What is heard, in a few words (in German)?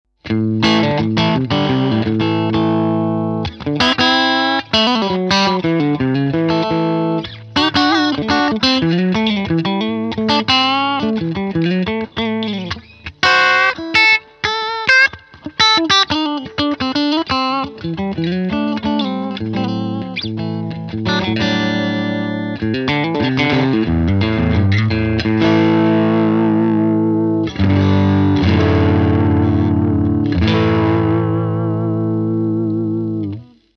Fender Tone Master Princeton Reverb angezerrt